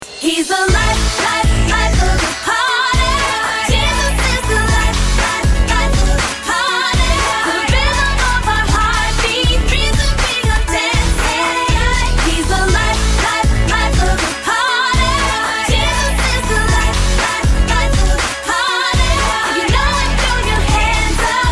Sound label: Young Pop Sample